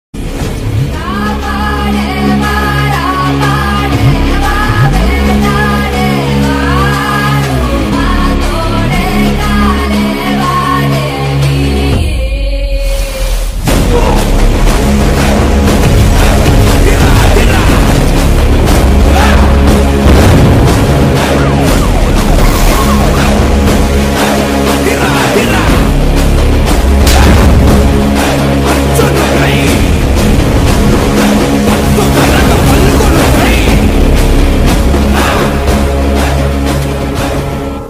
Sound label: villan beats; arjuna phalguna teaser bgm ringtone